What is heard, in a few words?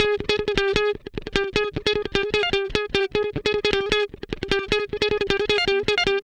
Guitar Licks